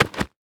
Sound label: Foley Footstep Golf Cleats Dirt Debris Single Step; Foley Footsteps